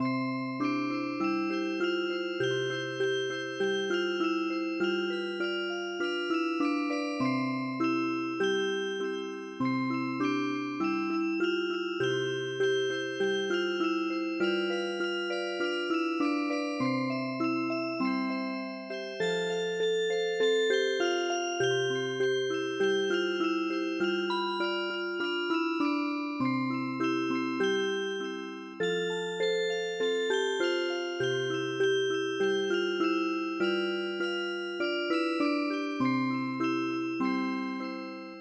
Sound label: Polka Music Box; midi; traditional